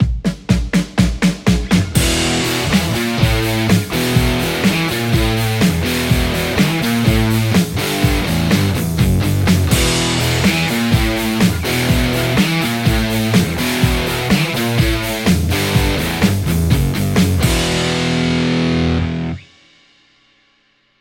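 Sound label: Telecaster; poulin hybrit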